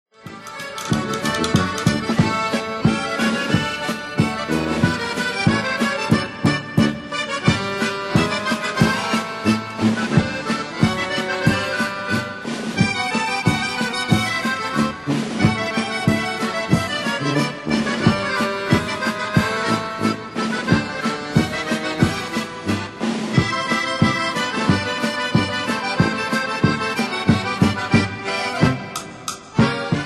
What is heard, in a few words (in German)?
Akkordeon, Polka